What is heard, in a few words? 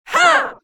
attack, spirit; slender; dbd